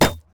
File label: minotaur basicatk; LoL; alistar